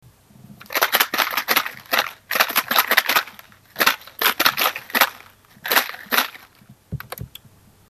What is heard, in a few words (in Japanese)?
huto marakasu